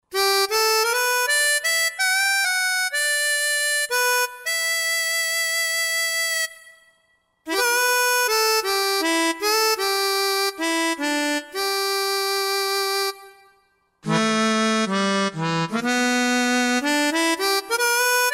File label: Harmonica, Roland JV-2080